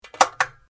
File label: fluorescent switch; electric